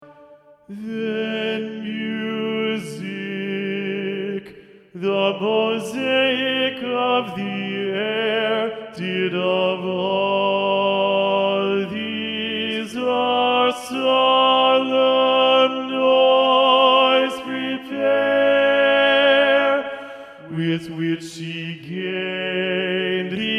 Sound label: T2 Predominant, TTBB